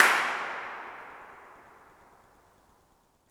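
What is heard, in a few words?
Reverb in abandoned hospital; IRL Recorded; Reverb Enviroments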